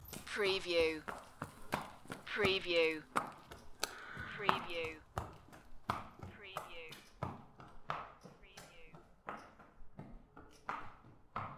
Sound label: Horse walking by on cement floor indoors